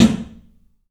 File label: club snare, 3D Drums; 3D Sound